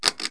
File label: pull; HOUSE